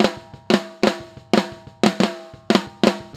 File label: Snare; Pro Tools Essential Demos